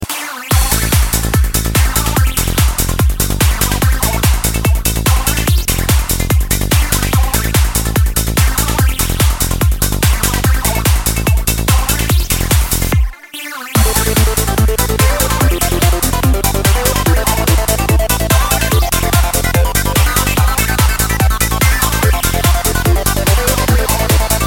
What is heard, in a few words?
psytrance